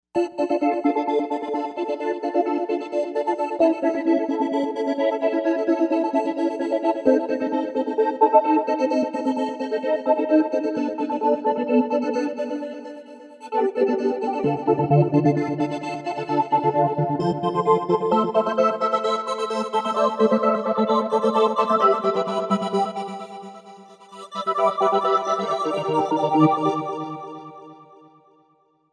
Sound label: Trance Angels, Novation UltraNova; Factory Patches